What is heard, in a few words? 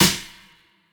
Snare, Dubstep Snares